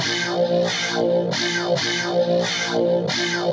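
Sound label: Rage Guitar Wet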